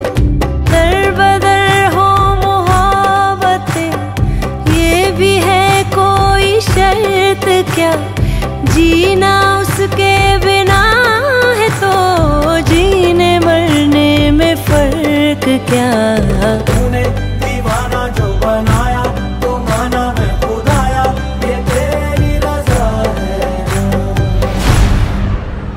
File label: Ringtone